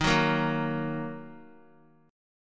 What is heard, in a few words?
Eb Minor Eb Guitar 4E 4A 5D 5G 5C 6F; Ebm strummed; JGuitar